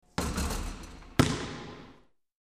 basketbol v zale brosok myacha popadanie v kolco ot shita

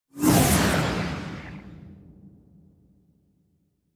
energiya magii strelyaet speceffektami

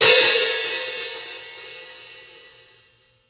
otkritij zvuk tarelok barabanov

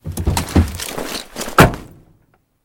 smeshannij grohot perebiraemih v speshke veshej

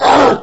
vorchanie

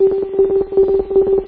otpravlyaetsya signal

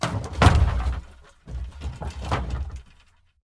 negromkij snos starogo derevyannogo sooruzheniya

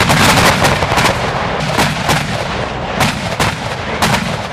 zvuk vistrelov celogo vzvoda gaubic

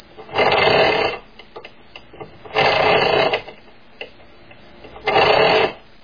ceplnaya pila shumnii skripuchii blizkii

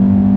gluhoj nabirayushij skorost zvuk dvigatelya

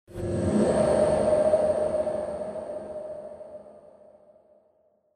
migayushie okruzhayushie zvuki v perehodnih perehodah

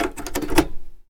podgotovka instrumentov dlya pochinki mashini

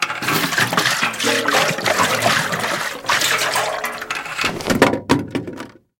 padenie metallicheskih tazov i razlivshayasya voda

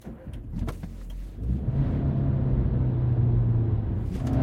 ritmichnij rovnij zvuk dvigatelya pered poezdkoj

